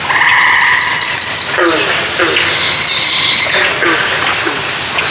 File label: marsh day; PC Gamer IT CD 41; Daikatana